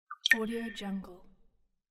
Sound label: water drop